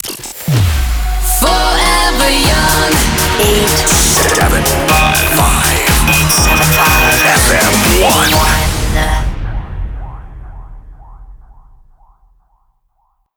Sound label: Shotgun, 122bpm Dm, jingle